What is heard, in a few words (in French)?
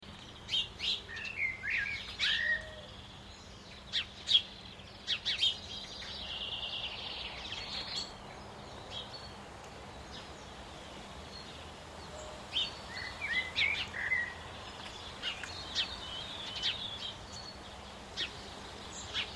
campagne oiseaux